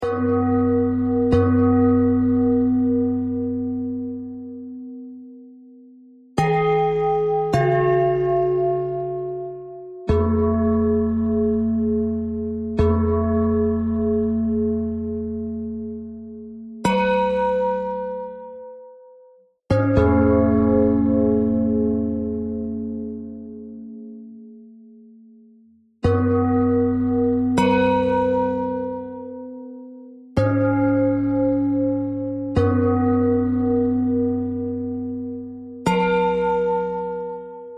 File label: bell bell thread noise